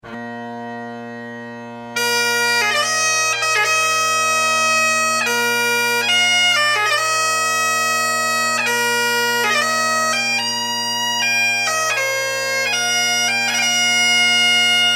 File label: Bagpipes